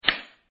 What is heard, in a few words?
audio card out; mahjong paohuzi